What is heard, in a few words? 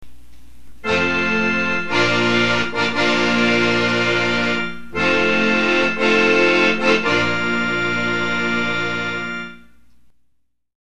Organ